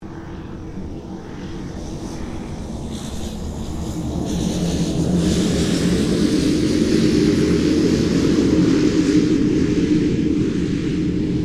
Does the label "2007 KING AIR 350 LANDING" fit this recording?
Yes